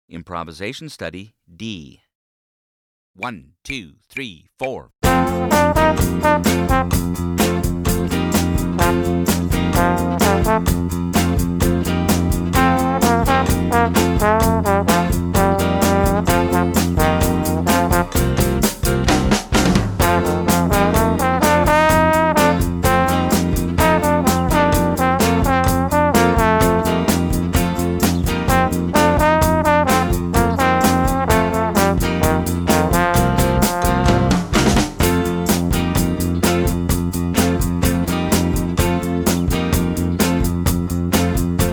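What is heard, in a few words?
improvisation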